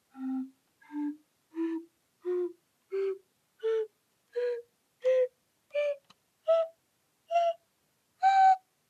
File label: yakuruto pan onkai